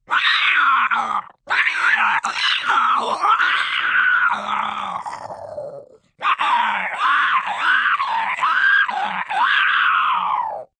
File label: Aliens Scream, Alien, screaching, scary, long (human voice)